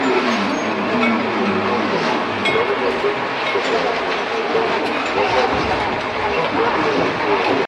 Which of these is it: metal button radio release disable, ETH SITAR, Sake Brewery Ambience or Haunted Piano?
Sake Brewery Ambience